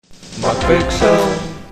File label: fanfare sad